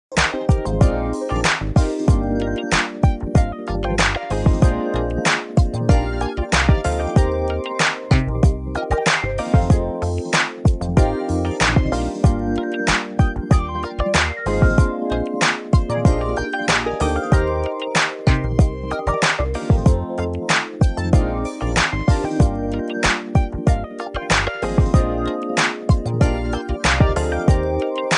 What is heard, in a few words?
golden beats